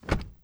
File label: Nissan Altima Door Open Interior; Auto; Transportation